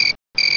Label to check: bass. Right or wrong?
Wrong